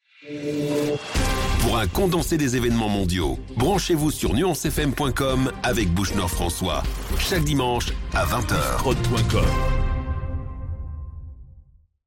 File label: Jingle; Nuance FM; Bouchenor